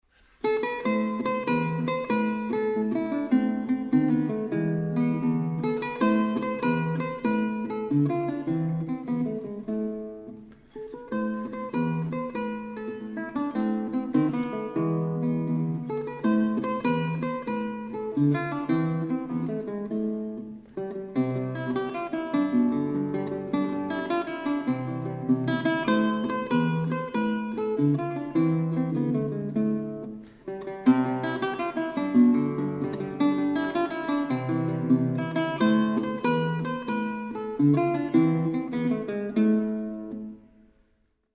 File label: guitar; Rondo In A Minor Ms93; Paganini